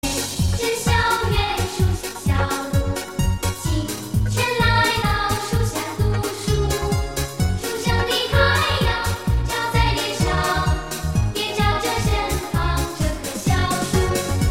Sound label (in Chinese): 起床铃声; 初中; 广播室铃声系统